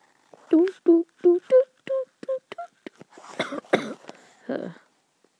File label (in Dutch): hoest